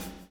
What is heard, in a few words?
BLOW; Spectrasonics - Backbeat